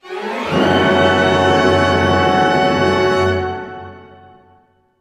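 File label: glissando F# minor, Orchestra; Sound On Sound - Peter Siedleczek's Orchestra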